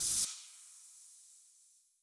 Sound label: mega shipyard electricity amb; Stellaris; federations